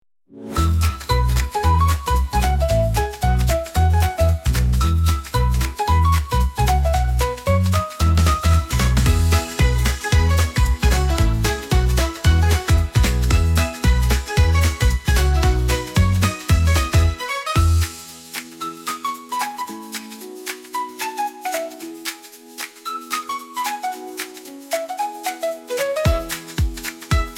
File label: kitten puppy baby waltz